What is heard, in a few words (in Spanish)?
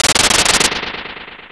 ruido rayo; RPG Maker; Legend of Heroen v1.20